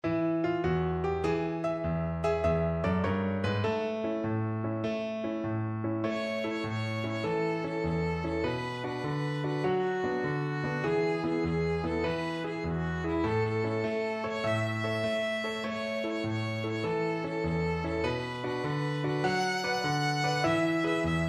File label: violin